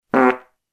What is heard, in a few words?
Fart Sound